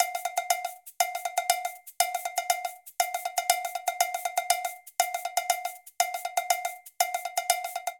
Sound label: Baion A Cabasa Cowbell-120bpm; Latin Percussion; Ableton; Factory Packs